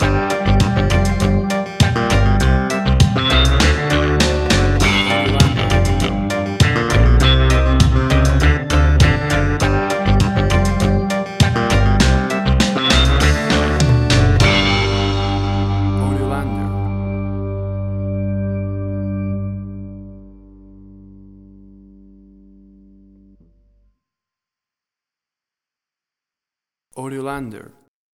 Carribean Reggae Music

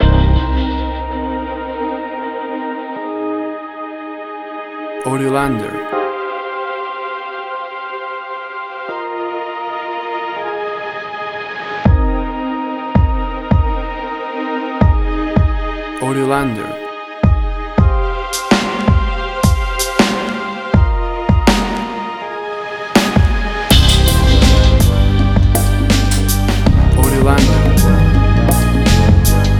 Timid Orchestral Hip Hop

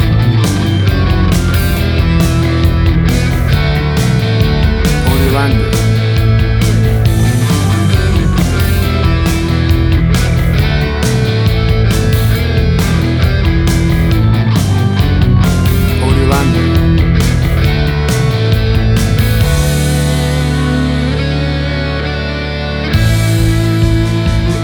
90s Classic Punk Rock Music

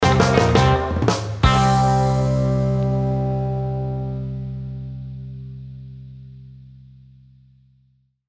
Upbeat 60s Surf Instrumental Rock Stinger

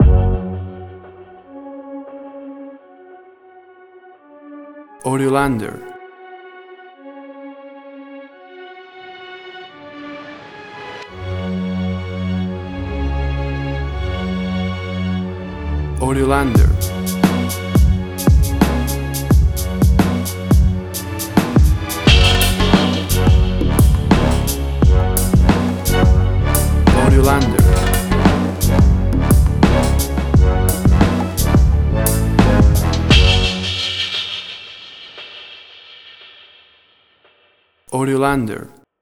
Turbulent Orchestral Hip Hop

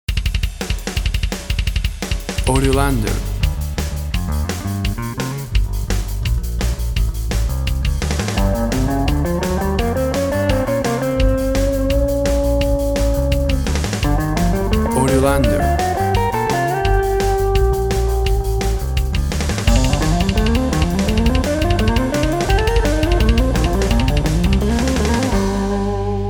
Action drums gtr bass 170bpm 4 4 Gm

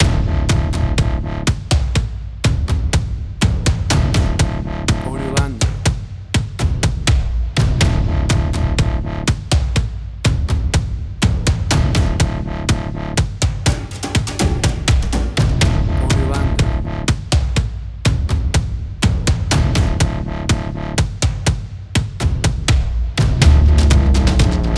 Neveready 123bpm; Suspense, Drama, Quirky

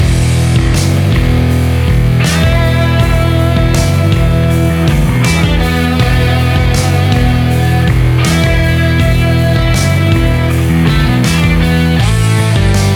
Angry Classic Rock Music Mix

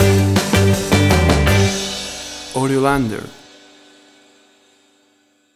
60s Night Spot Stinger

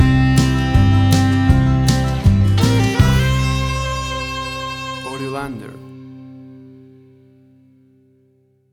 Banjo Country Love Stinger; AUDIOLANDER

